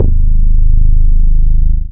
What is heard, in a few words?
BAS MINI, Mini-Moog